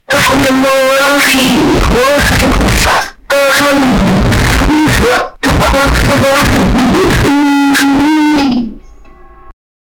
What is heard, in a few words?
animal call